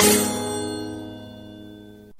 bell ringer

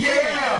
"yeah" chant

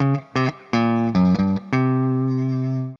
guitar, Jazzmatazz loops

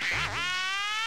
horns, Phonky loops

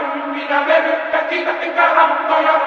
voice un dida meda da dida dida rum foya; Sad

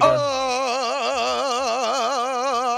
"ahhhhhh"; Voice; ooh ahh

